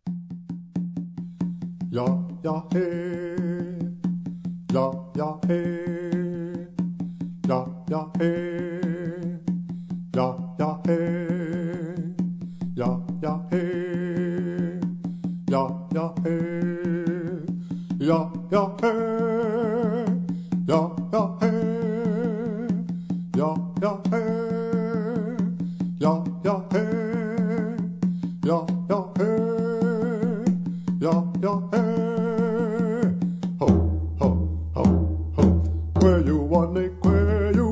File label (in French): Chant de danse sociale